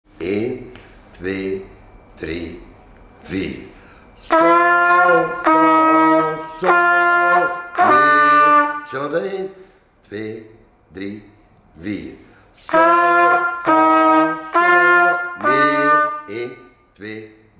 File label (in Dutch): fanfare les